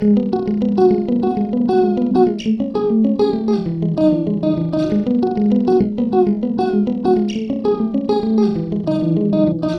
guitar going down

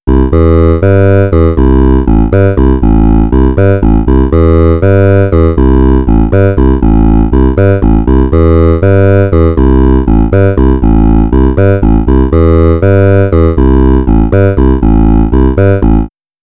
programmable wavetable

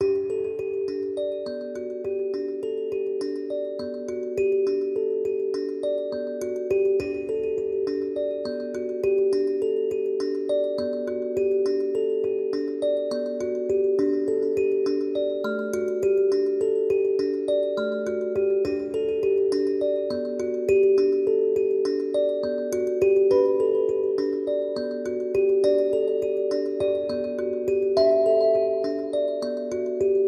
dark kalimba heart of africa

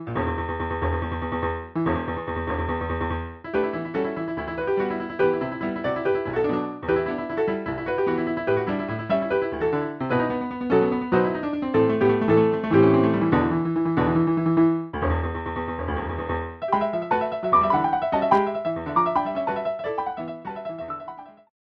yamaha c5 grand piano

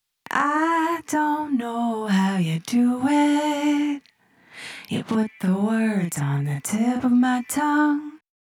vox chorus drift

